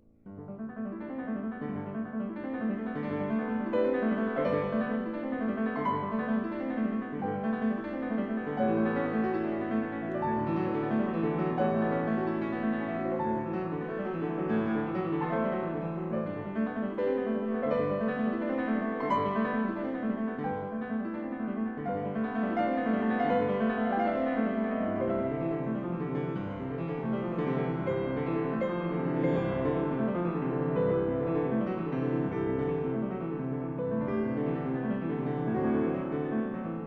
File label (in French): En Sol Majeur Vivace, Piano Solo; Chopin